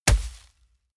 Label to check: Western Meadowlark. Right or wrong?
Wrong